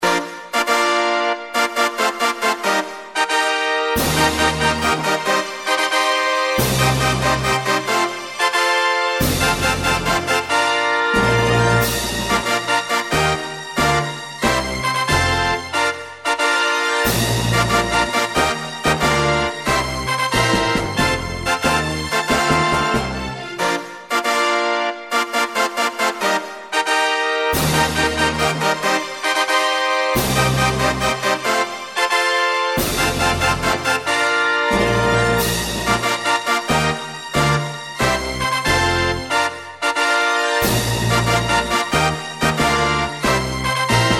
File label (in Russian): TRUBA TORZH